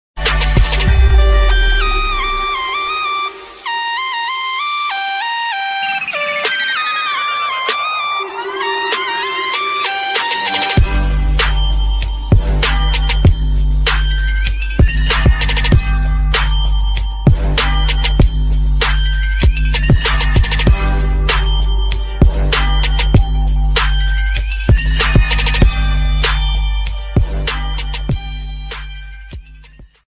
girl attitude ringtone girl power ringtone english bgm